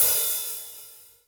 H H, STUDIO ST HH

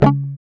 C#, Acoustic; Sound & Vision; Gigapack I CD 2; GUI ACOUST.; GUI Acoustic 1

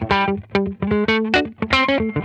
FURRY, Funk Guitar; Zero G - Funk Guitar

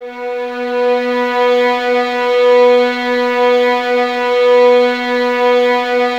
violins B 3, string ensembles; Miroslav Vitous; 11 Violins

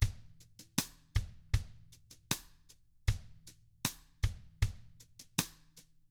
COUNTRY, Drums

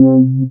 SYN SH, Roland; Sound & Vision - Gigapack I CD 2

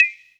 Percs, Elektron MachineDrum; SPS1 MKII

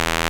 JX PULSE; E-MU Producer Series Vol. 2 – More Studio Essentials

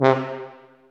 TUBA, Tuba stacc; Roland L-CDX-03 Disk 2

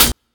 KIT, Dance Mega Drums, HIP

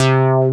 SELECTED BASS; Masterbits; Dance Trax

